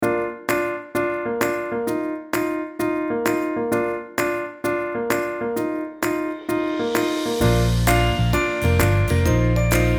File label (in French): musique normale